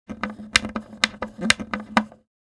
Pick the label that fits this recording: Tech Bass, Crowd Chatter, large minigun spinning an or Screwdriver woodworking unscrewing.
Screwdriver woodworking unscrewing